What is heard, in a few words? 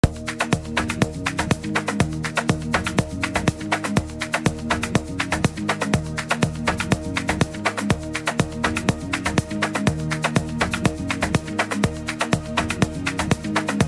afrohouse flamenco